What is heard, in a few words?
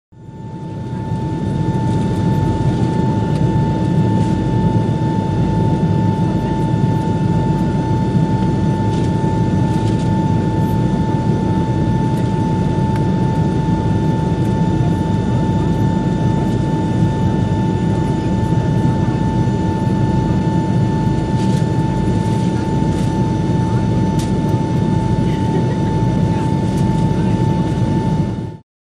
Jet Interior Boeing 727